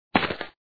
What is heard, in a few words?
drop; Gun Mayhem 2